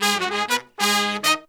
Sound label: 96BPM, Horns; Phantom Horns; 90 s Sample CDs; Zero-G